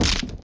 Sticky Hit Target; Golf Blitz 1.12

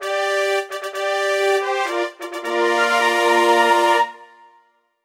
FANFARE (High Score); the princess bride

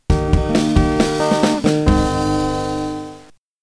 Got Deer; Deer Hunting USA; arcade

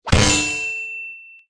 Good Hit

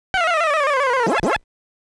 Fail; Pac-Man 40th Anniversary Album; Join the Pac

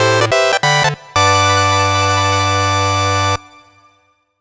Mini-Game Goal; Yoshi's New Island

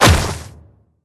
Earthshake; Hero Jigou

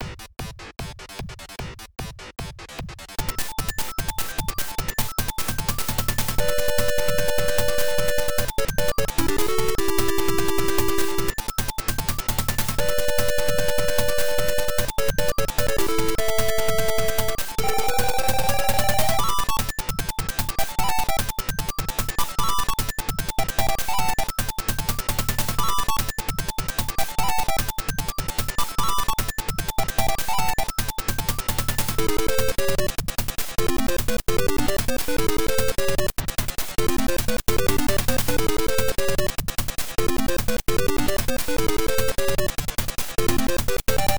Round BGM; Logical; PC-98

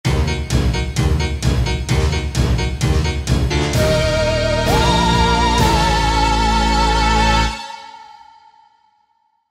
Spooky Opening; Spooky Skincrates Opening; Tower Defense Simulator

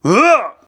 battle leap; Zote

